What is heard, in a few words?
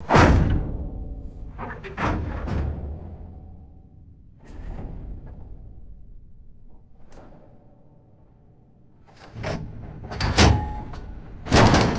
heavy metal truck bed bumps; vehicles